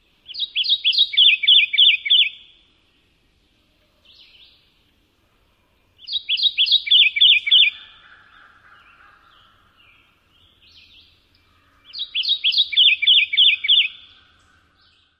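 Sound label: 2-parted songs, bird; KY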